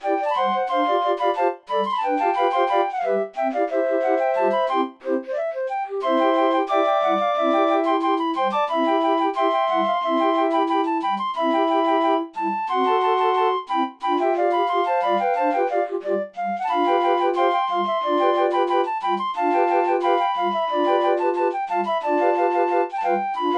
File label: Rumba, draaiorgels